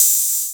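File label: SIMMONS SNARE; FUNK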